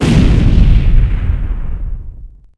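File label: explode; UrbanChaos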